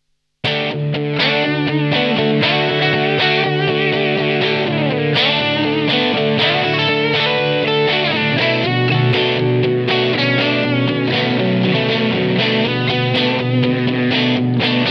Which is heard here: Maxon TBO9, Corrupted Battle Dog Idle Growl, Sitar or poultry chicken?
Maxon TBO9